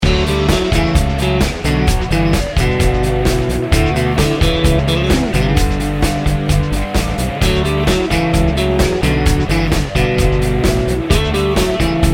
upbeat soft rock

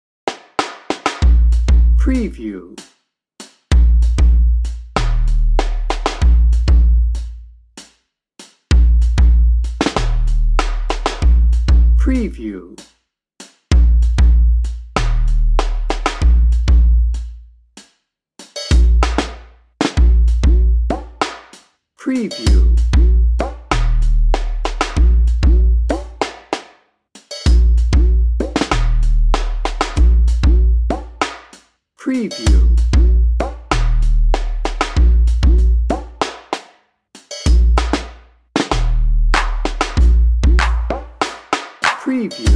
swing town dnb

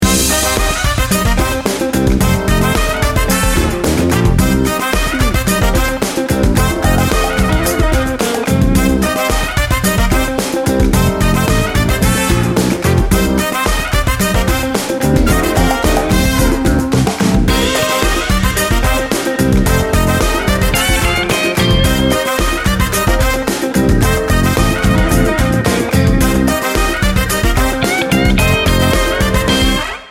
funky bones

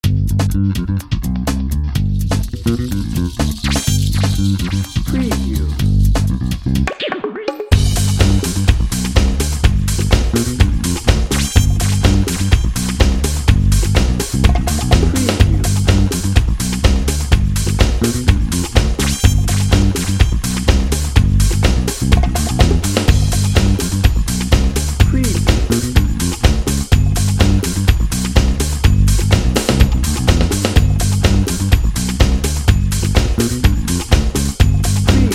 celebration funk house

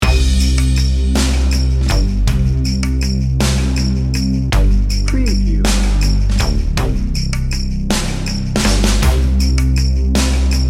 good king wenceslas

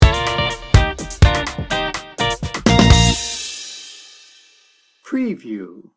happy funky guitar sting bumper

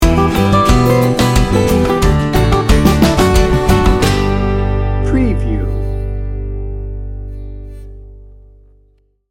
tropical cumbia party sting bumper

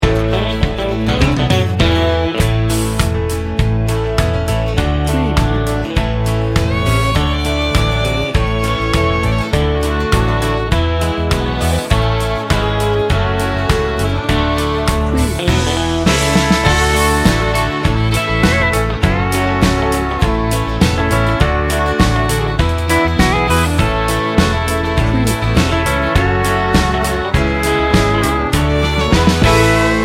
texas bbq

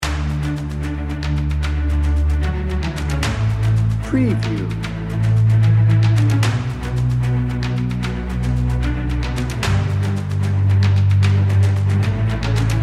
majestic triumph loop

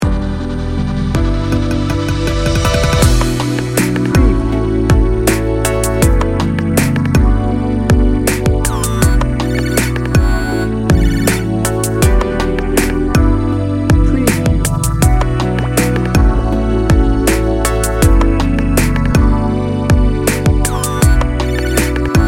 dreamy

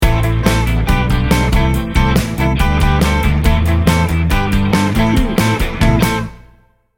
beach day sting bumper

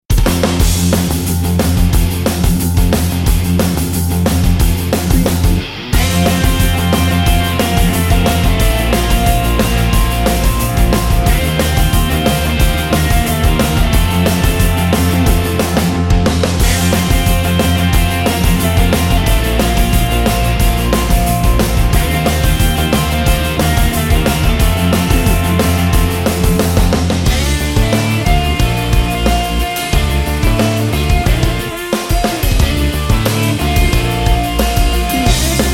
pop rock party

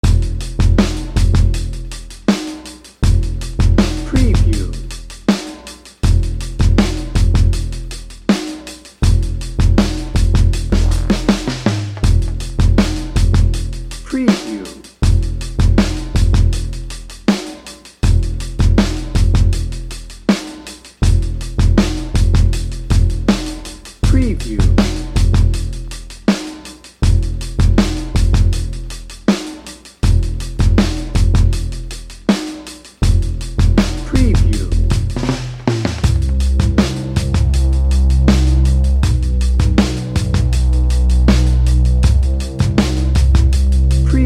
dnb

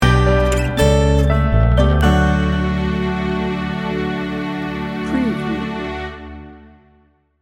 joy and hope loop

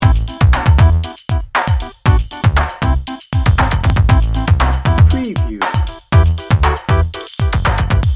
hip and groovy loop

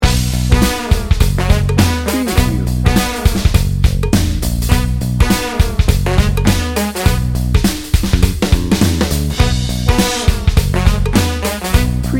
funk n brass no guitar

